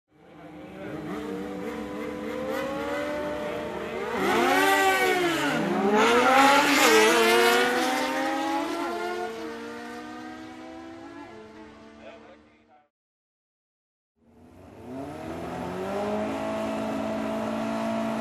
Motocross, roar of engines, regassing, start of races; motorcycle racing